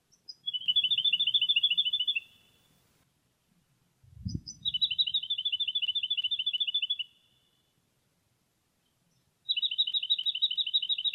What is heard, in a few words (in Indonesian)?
suara jacky winter